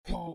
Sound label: player hurt; blocks and bullets